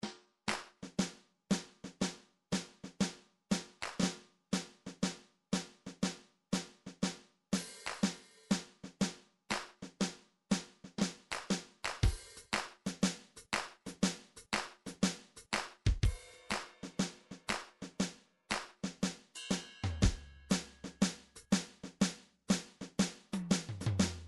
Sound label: drums percussion; midi vol 4 drums percussion